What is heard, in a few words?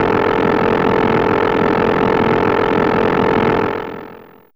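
Oscillator, Fairlight CMI IIe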